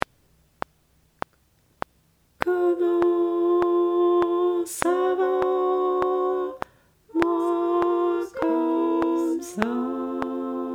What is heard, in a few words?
Alto